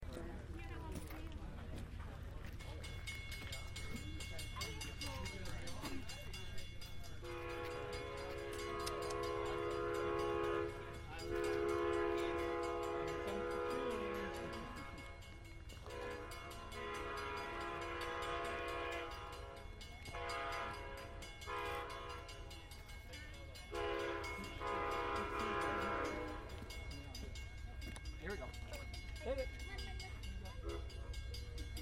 amtrak passenger train arriving and leaving from columbus wisconsin